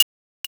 stopwatch

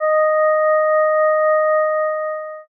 tibet bell

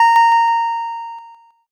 ding

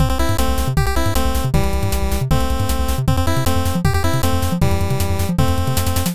bgm

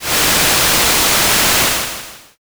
rain

